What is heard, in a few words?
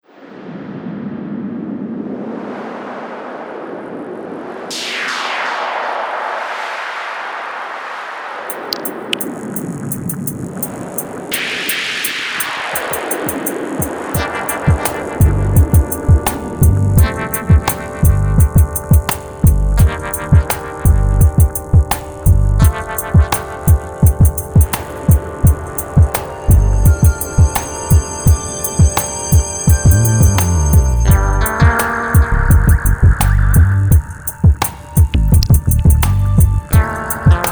Phrase, Trip-Hop; UA Masterclass